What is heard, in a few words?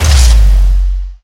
failed; CDSAE3 Lian Lian Kan